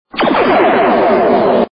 laser; he-man

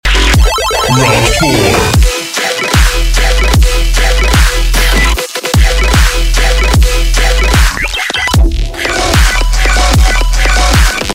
remix

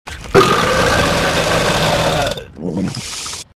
super burp

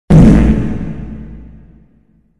fart with reverb and bass boost

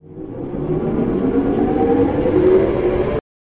loading laser guns; astro bomber

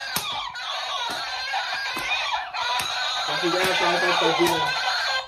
sad kid

